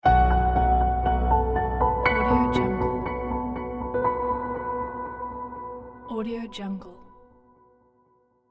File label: clean reveal logo